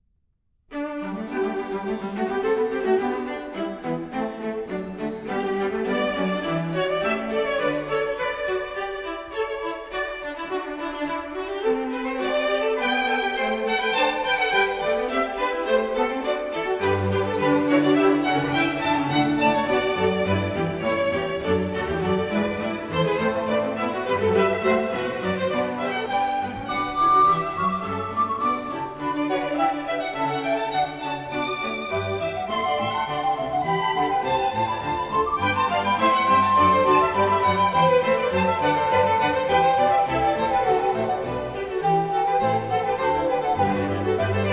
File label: Concerto in G major, Presto; Bach; Brandenburg Concerto No. 4; BWV 1049